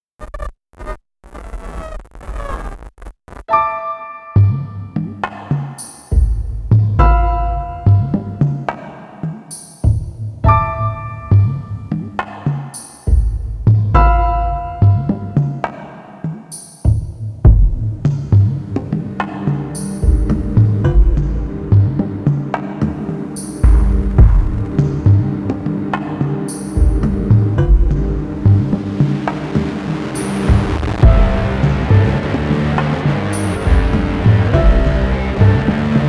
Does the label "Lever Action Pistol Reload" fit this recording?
No